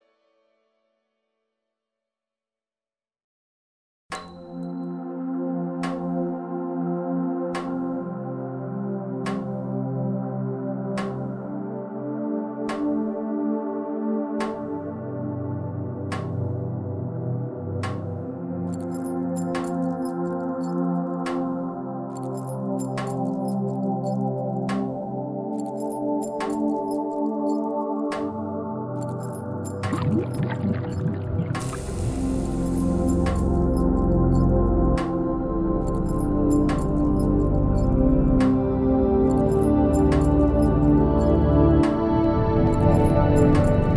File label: Steampunk Interlude; Everfree Radio